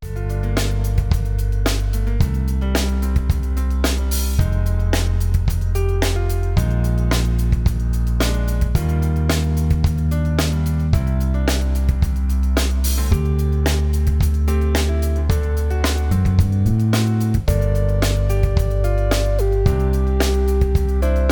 Electro

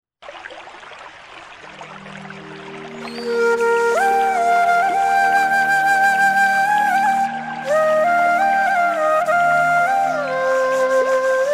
Evening Flute

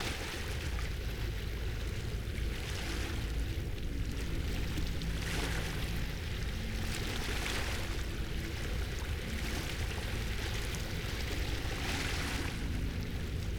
Lapping Tide

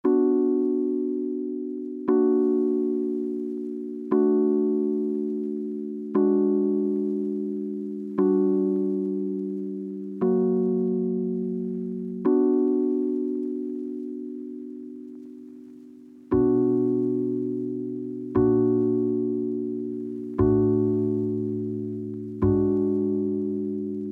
Jazz Club

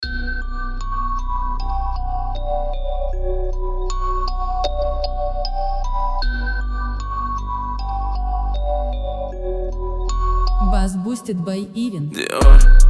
BassBoosted

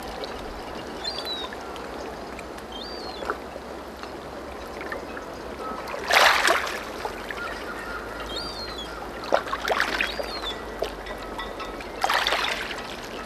Soothing Beach Ambience

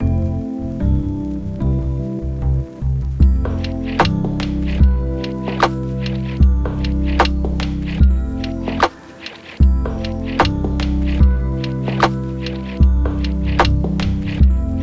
80s Ballad

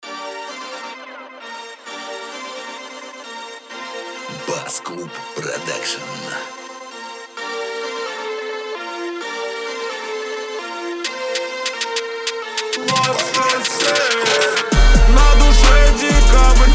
41-46Hz Slowed Bass

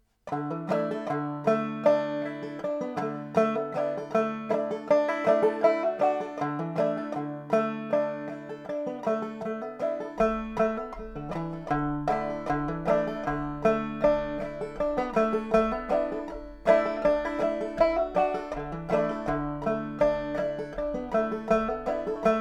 Slow Banjo; Billy in the Lowground

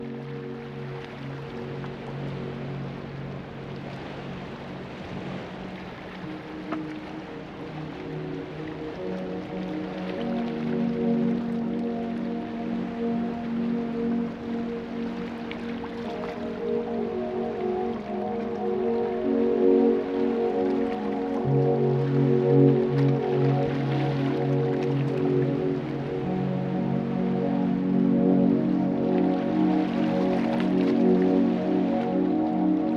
Rain Nature Cicadas Birds

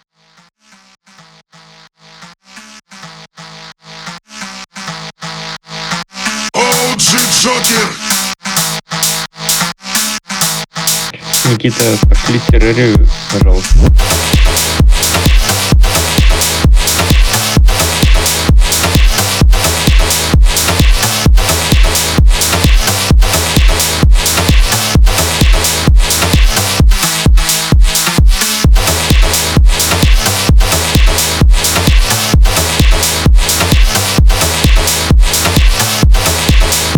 PHONK TRACK

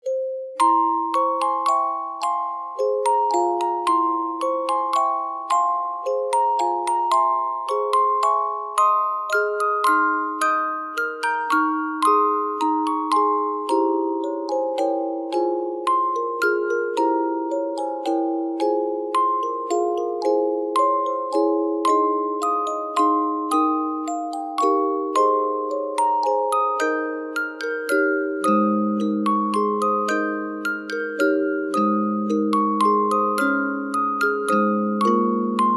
Lullaby, Brahms Waltzes op.39 no 16

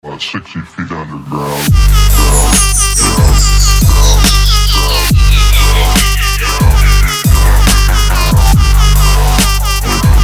Trap Ringtone

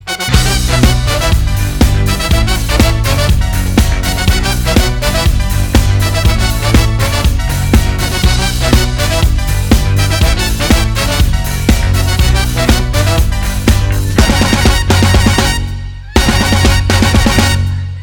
Instrumental Ringtone

